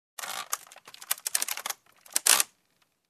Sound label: Tool Creak